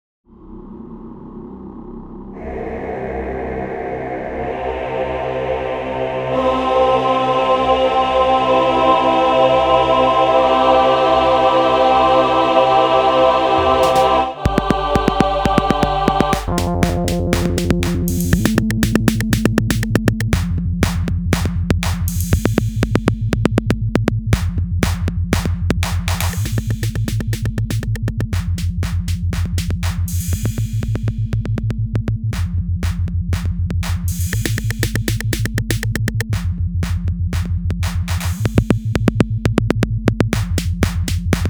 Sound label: MIDI ditty; spellman studio